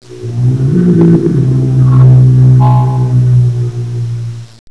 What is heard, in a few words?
drown, bug; scourge